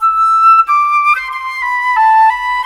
Flute, Cinematic; Sonic Foundry (Sony Creative Software); Orchestral Series VOL-3; Cinematic 24

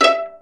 PIZZ VIOLN; E-MU Formula 4000 Series Vol. 5; Protozoa; Proteus2 Instrmt

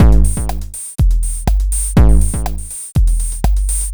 Beat, Drums & Percussion; Electrocution; Beats

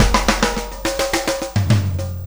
Fill, Loops; Sonic Foundry; Not Just Another Pretty Bass; AI Loops - Siggi Baldursson

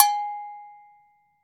AGOGO BELL, Brazil; AKAI S6000 CD-ROM - Volume 5

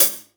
sfx perc

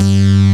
SYN BASS; Pro-Rec - Synthsonic; TRINITY

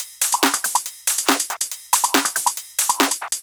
drum Loop 140BPM Full

